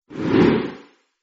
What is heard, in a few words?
whoosh; spongebob; super-easy-fun-adventure